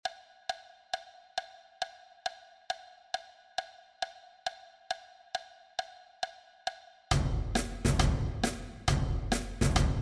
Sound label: perc